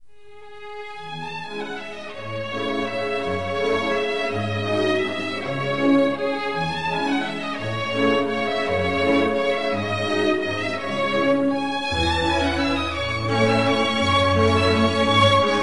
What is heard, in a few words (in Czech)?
Serenáda pro smyčcové nástroje E dur; op. 22; 1875; Antonín Dvořák